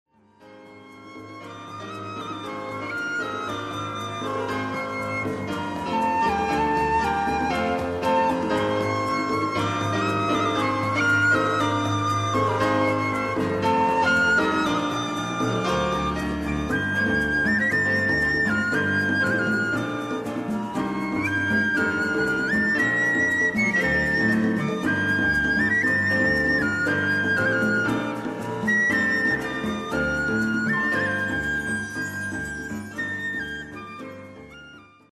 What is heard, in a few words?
Instrumental